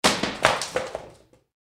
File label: Harsh